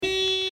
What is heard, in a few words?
car horn